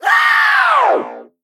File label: Male Mech, Death